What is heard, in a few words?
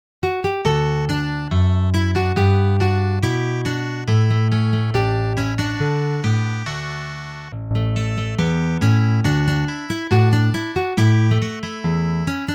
instrumental